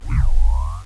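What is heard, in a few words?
Wood Monster Attack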